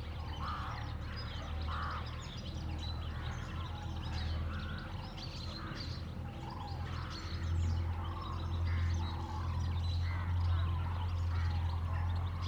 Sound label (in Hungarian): távolabb darvak varjak durranások; hortobagyi nemzeti park 2013; daru vonulas